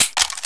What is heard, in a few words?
reload Revolver Clip Out; SAS: Zombie Assault 4; Android; macOS